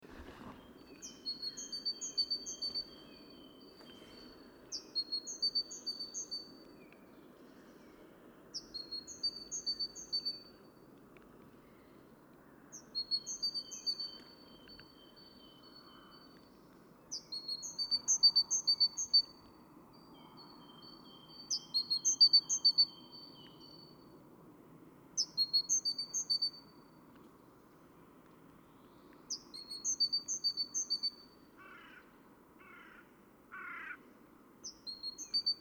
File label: Parus major; SETE